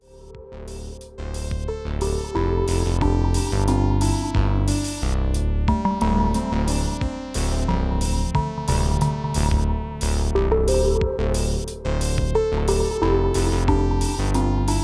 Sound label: Sequencer jam; The MPC Session